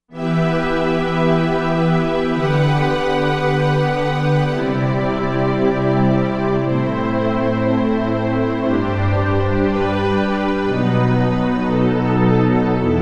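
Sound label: Mellow Strings, synth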